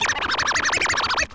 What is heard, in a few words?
scratch